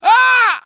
hurt heavy; cj

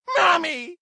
hurt heavy; joker

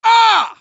hurt med; cj

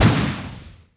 blaster hit; weapons

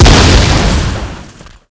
explosion

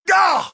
hurt med; joker

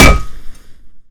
pan hit, frying pan, melee; weapons

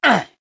hurt light; cj

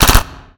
smg fire burst; weapons

